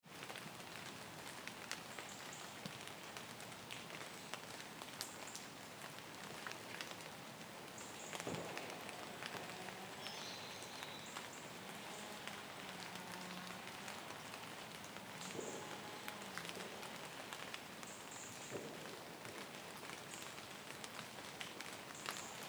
Brede High Woods

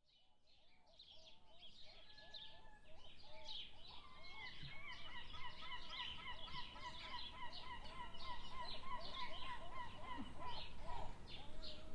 Morning in my garden Denmark

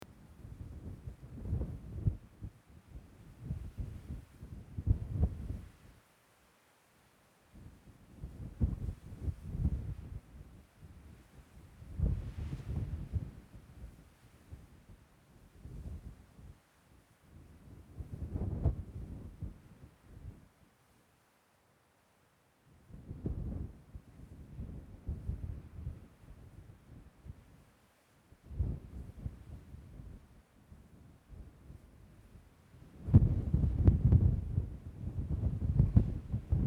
sound mirror; Abbots cliff; kent uk

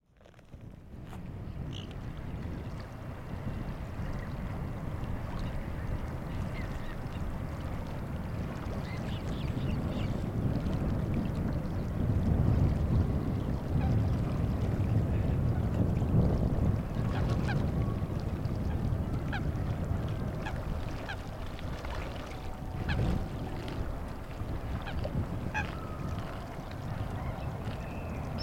Wetlands field recording; Walthamstow

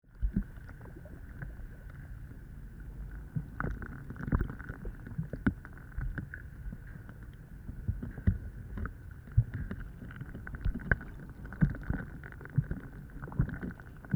gansevoort beach under ice; imbolg

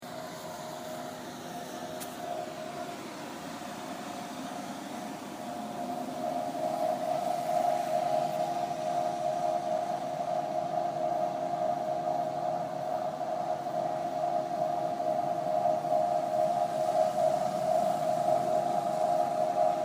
Cern electronics pool

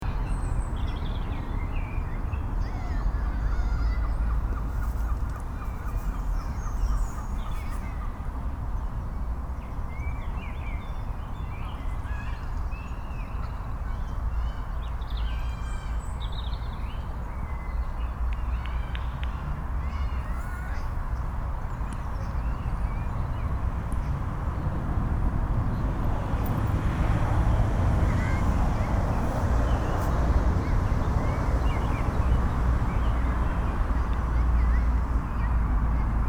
Victoria Park Glasgow

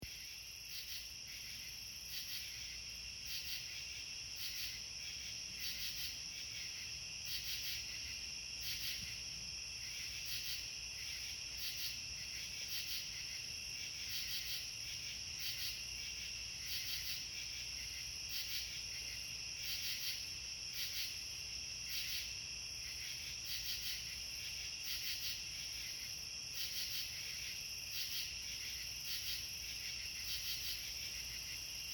midnight cricket party

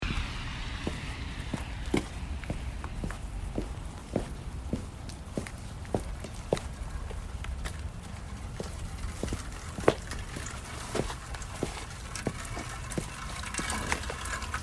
Rain Gutter

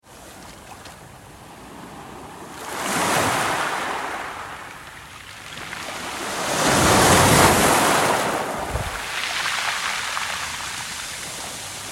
Ocean rocks; La Napoule

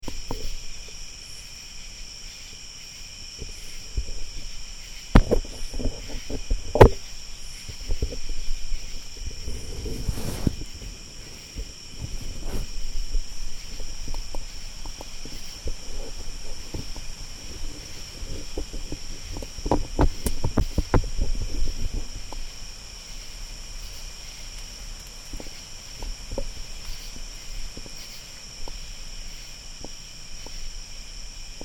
Delaware Water Gap

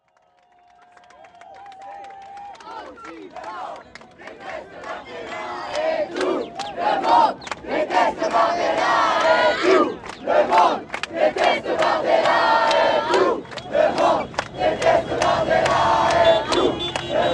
Midnight; After the election, Cours Julien, Marseilles, France just before midnight on Sunday 7th July 2024; People gather to celebrate in Place Jean Jaurès hours after the Nouveau Front Populaire (an alliance of left wing movements in France) beat

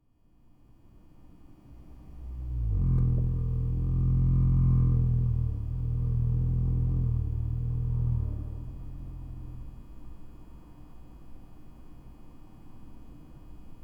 singing extractor hood

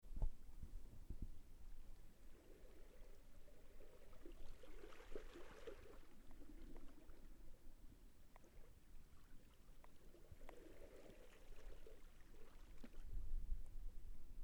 Pebble Bay Nuuk Greenland

